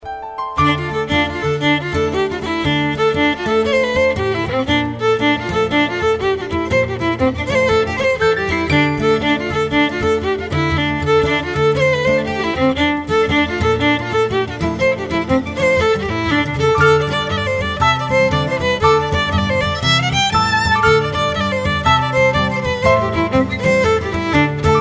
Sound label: jig, fiddling; kangaroo jig